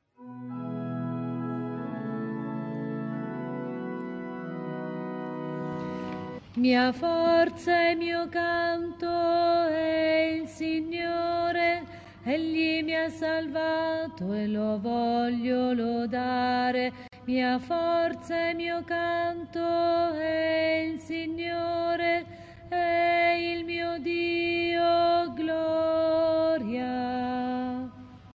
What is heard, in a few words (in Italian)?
contralti